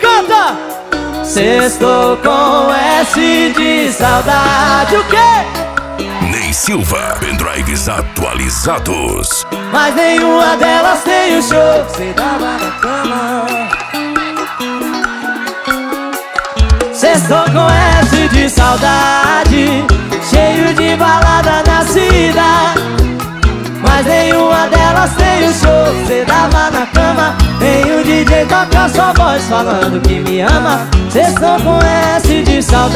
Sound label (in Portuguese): sertanejo